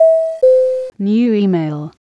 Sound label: Ding Dong New Email